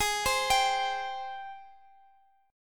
Ab Major 7th Ab, Guitar Open D; down

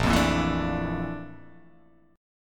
Bb Major Sharp 11th Bb down, chord; strummed; JGuitar

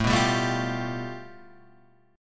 A 7th Suspended 2nd Sharp 5th

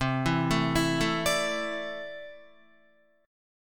C 6th Add 9th C down Guitar; arpeggiated; JGuitar

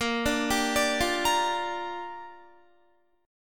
Bb 6th, Guitar; arpeggiated; 18,17,17,19,17,17; 4E,4A,5D,5G,5C,6F

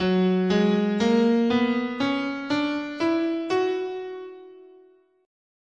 Gb Fifth Mode, scale

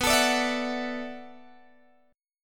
B Minor 11th B down Guitar Open G

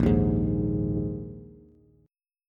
Bb 7th Suspended 4th Bb Bass; strummed; JGuitar

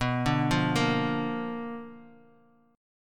B Minor Major 7th Flat 5th Bass Standard; arpeggiated; JGuitar

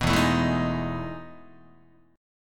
E Major 11th E, Guitar; EM11 strummed; 2,0,0,2,0,2; JGuitar

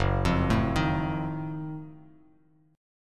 F sharp Minor Major 13th down, Bass Standard; arpeggiated

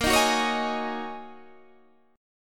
G sharp 7th Suspended 2nd Suspended 4th

